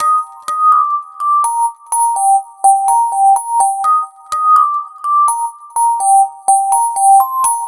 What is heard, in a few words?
Glass Bell